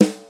00s tight low end steel snare drum sound g sharp key